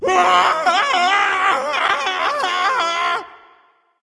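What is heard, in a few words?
non verbal fear, voice; cernunnos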